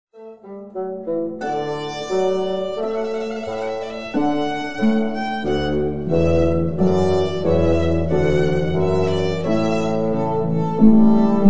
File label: Trumpet Voluntary